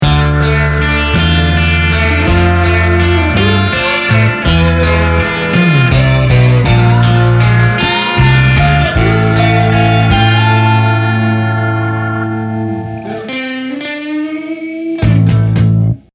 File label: sound test